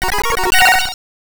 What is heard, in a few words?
Victory Jingle; ottifanten kommando stoertebeker; gb